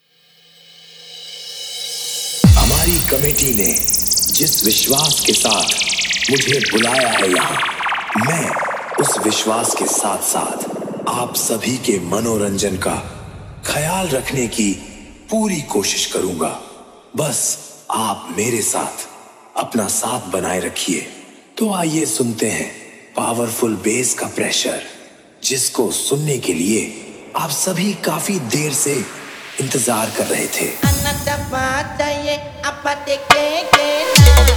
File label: Insta Viral Djsong Edm Remix